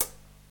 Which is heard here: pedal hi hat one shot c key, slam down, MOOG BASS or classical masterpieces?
pedal hi hat one shot c key